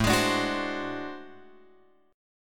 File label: A Minor Major 13th; {5 X 6 7 7 8} strummed